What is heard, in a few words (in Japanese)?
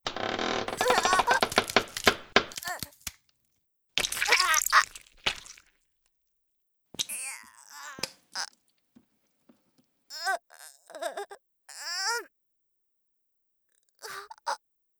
プレス機械